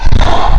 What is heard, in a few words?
jump; Alien Arena; xenoid